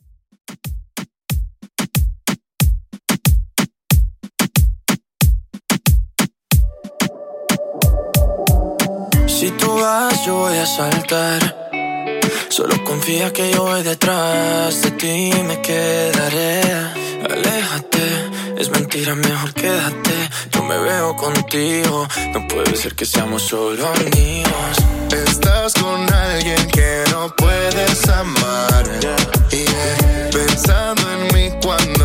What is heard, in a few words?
Latin Moombah 92Bpm